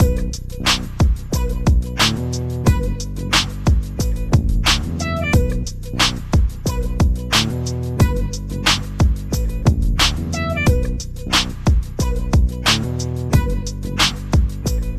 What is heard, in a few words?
beat